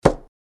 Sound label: UI invalid sound